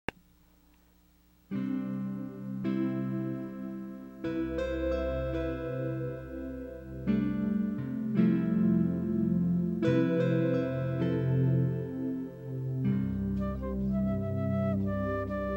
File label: flute